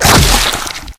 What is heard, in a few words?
zombie slice; hit